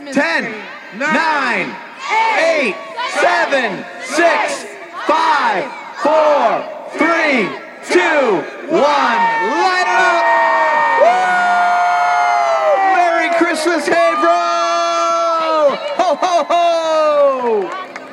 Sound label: tree lighting